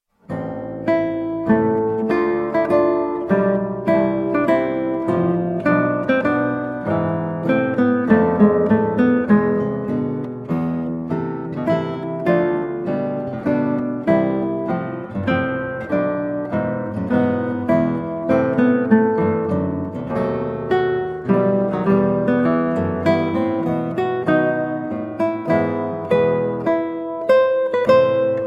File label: Adagio from Sonata in A Minor, Guitar